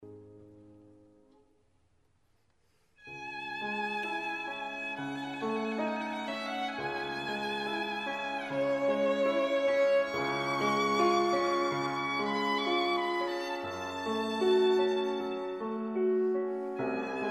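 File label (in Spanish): dúo violín piano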